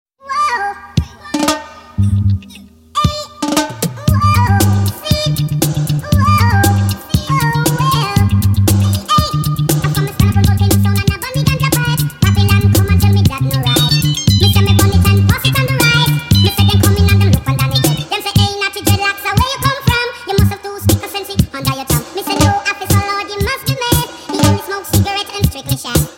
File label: Reggae